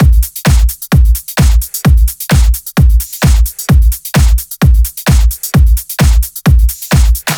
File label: 130BPM Kit; Vengeance Samples; VFH1 130BPM Danzon Kit